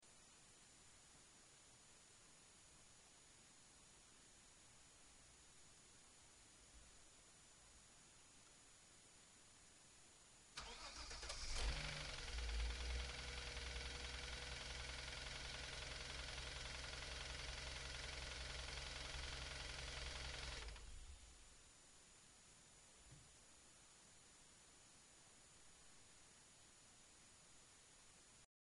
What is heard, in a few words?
engine